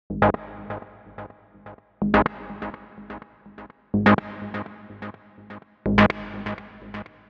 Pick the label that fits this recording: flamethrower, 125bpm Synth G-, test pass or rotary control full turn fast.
125bpm Synth G-